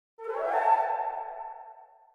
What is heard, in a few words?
Flute Success; Hack and Shoot Heroes; PS4; Windows